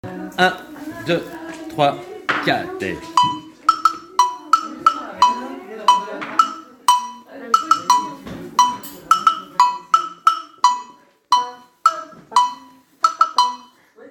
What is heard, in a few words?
Agogo theme, samba reggae